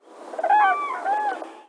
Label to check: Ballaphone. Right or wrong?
Wrong